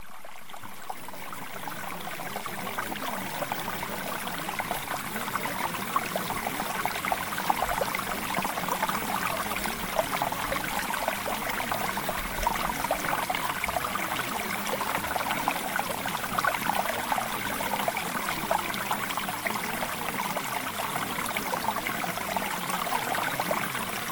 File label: Mountain Stream; SUCCESSFUL MUSICIAN